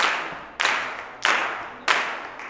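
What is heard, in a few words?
CLAPS 96, Gospel; Blues & Soul; G.CLAPS 96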